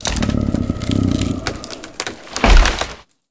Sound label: barkman die; Fate The Cursed King; MONSTERS